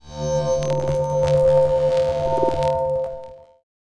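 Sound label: snake poison attack cast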